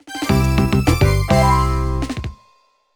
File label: Sting; active health with carol vorderman; nds